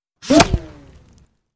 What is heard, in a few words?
Bullet Impacts With Whir In, Impact And Debris